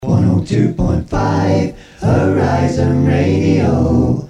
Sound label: Acapella, Radio; 102.5 Horizon Radio